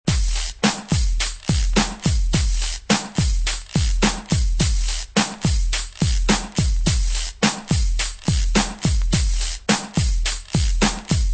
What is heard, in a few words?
drum loops